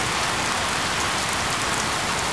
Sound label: rain, ambience; XDM